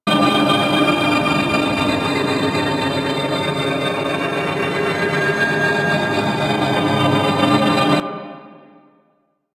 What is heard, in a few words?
Hub Codex Center Hologram Loop; Tenno; Warframe; Ambience